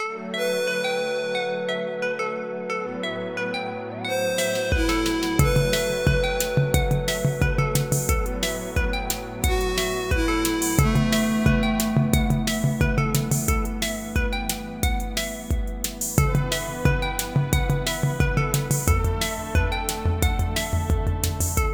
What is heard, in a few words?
catchy